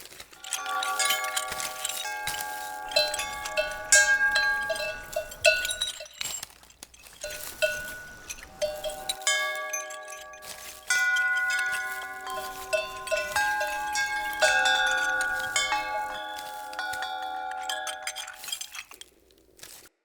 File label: Corrupted Biped Walk Foley Loop; Bromius